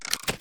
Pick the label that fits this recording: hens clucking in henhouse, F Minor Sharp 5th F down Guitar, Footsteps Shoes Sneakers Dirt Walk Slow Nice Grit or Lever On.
Lever On